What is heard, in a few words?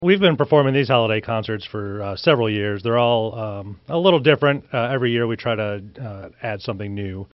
Brass; 1st infantry division band; holiday concerts